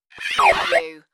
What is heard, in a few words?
Static Blip